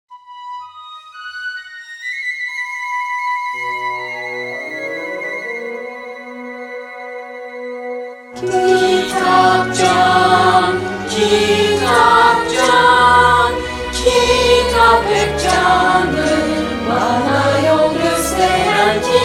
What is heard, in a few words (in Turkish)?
SARKI COCUKLAR SOYLUYOR; Kitapcan